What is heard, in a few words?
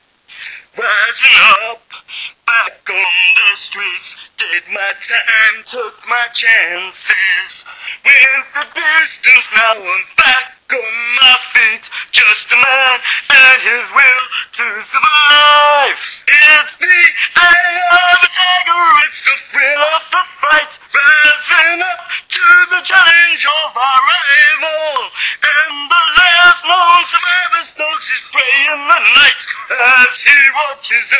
radio; fatman